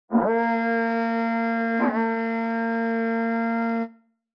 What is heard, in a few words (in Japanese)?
Horagai